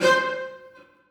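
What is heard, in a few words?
celli staccato c2; Sonatina Symphonic Orchestra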